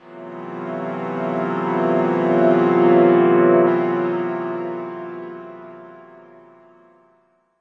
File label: backwards pianoesque pad; Pads